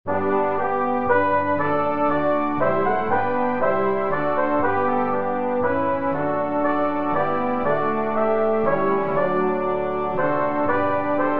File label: Hymn